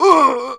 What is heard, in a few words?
male die; firefight